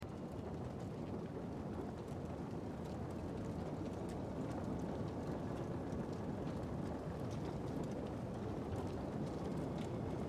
Burning Hells Ambience; Tiny Rogues

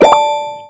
button; ChooChoo Heroes

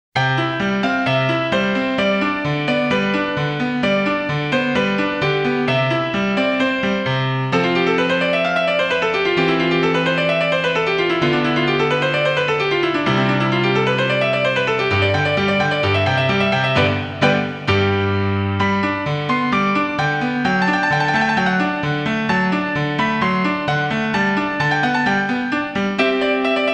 Piano Lesson; Tales of Phantasia

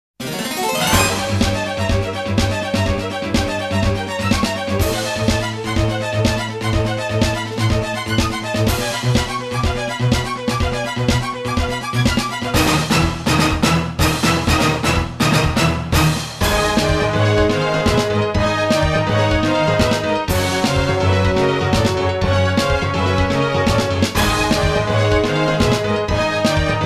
BATTLE; Favorite Dear

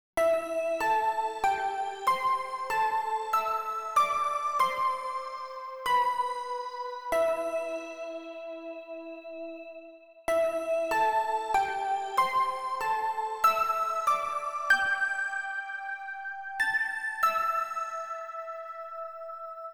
Pluck Melody; Super Crush K.O.

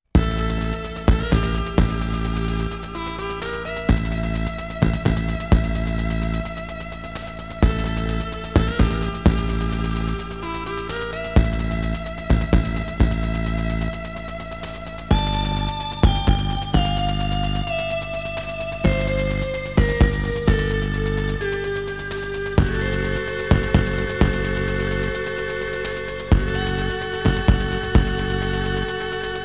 INFILTRATION (Alert Mode); metal gear 2 solid snake

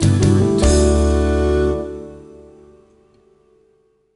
Level Complete; Mind. Body. Soul. Blend It; NDS

